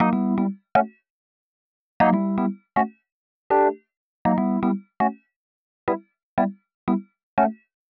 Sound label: 120 Rhodes Riff Chords A, Keys, Nu Jazz House; PowerFX